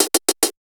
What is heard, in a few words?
Allert Place; FREE SFX PACK VOL 1